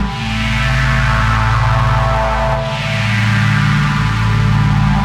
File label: Ober Poly, 95bpm, A, analogue circuit; musicradar